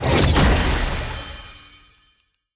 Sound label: Enemy Boss Tormented Shadows Cast; bloom minigame